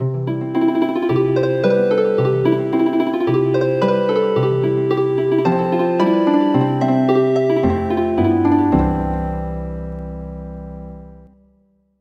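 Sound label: Healing Harp, midi traditional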